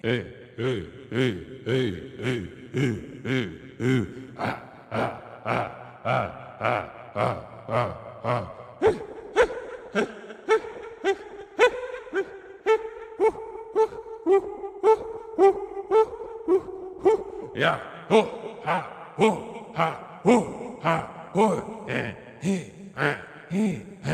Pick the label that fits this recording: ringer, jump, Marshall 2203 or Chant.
Chant